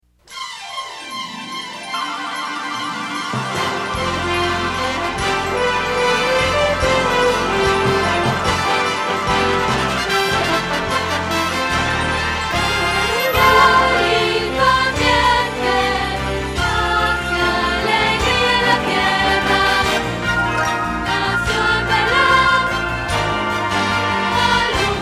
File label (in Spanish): Contralto